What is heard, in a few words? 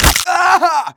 flesh break; Team Fortress 2